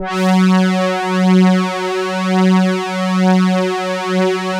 Elka Synthex Brass

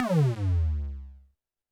Roland TD-20 Percussion

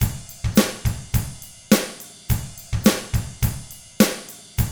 105 POP RK, Drums; ILIO; Double Platinum Drums 1

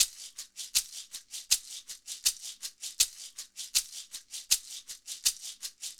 Cheap Maracas; Sonic Foundry (Sony Creative Software); Joe Vitale Latin Percussion

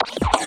SFX, 180 BPM, Drum & Bass

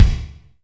Reverb Kick Snare; PS-37 Dance Synths; Percussion

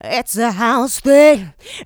Female Vocals; Houseworx

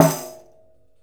Tambourine Hit; Essential Percussion

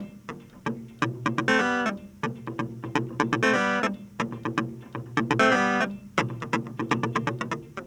Clavinet, 70s Analog Funk; Sonic Foundry (Sony Creative Software); What it Is!; Super Brown

